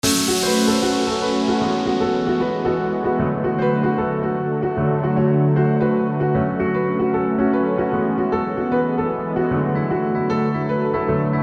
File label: piano jam